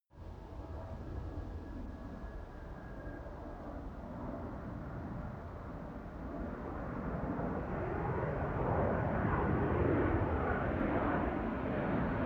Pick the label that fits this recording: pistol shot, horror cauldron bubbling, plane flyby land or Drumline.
plane flyby land